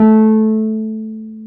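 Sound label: piano Wurly; Keys of the 60s and 70s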